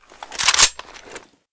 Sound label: groza misfire, weapons